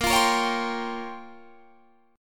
A sharp Minor 6th Add 9th A sharp down Guitar Standard, chord; strummed